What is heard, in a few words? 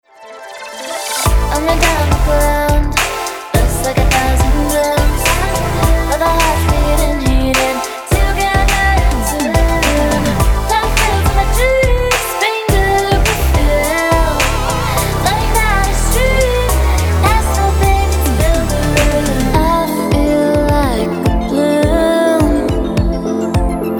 POP